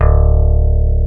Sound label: JBASS G2; East West; Global Sounds